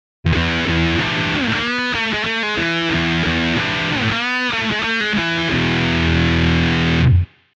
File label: Big Muff